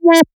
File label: Synth Sound; Vengeance Samples; VEE Synth Shots